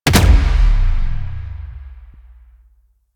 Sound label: Gunbrella Alt Punch, Weapons; Warframe; Tenno; Koumei